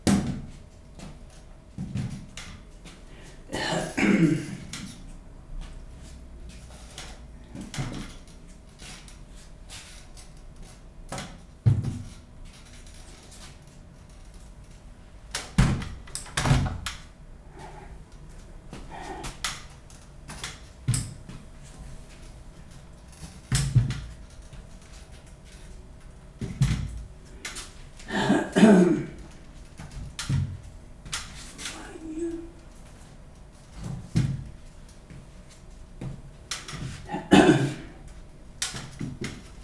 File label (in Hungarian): lakásban járókerettel; angyalfold